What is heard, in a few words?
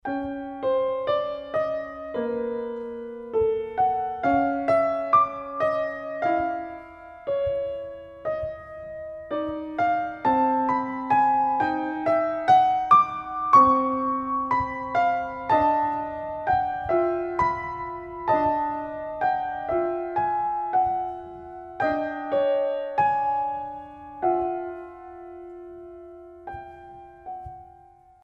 Lullaby